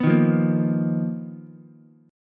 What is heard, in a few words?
B Major 7th Flat 5th B down Ukulele; BM7b5; strummed; JGuitar